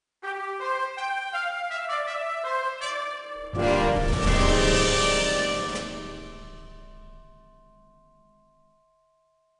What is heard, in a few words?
Game Solved; Jewel Legends Tree of Life